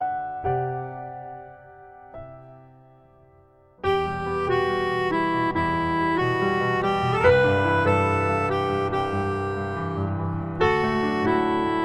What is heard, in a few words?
Piano Theme